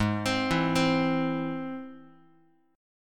Ab Augmented Ab, Guitar, 4A, 4E, 5A, 5D, 5G sharp, 6C sharp; Ab+ arpeggiated; JGuitar